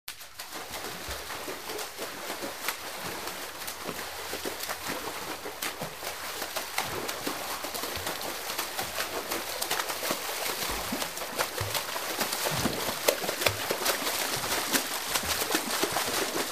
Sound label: Swimming Arms Kicki